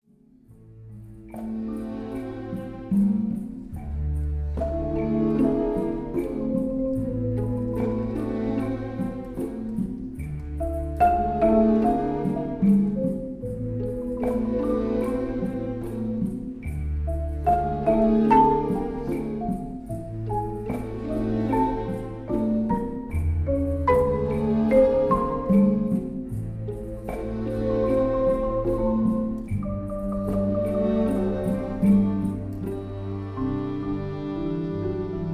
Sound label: marimba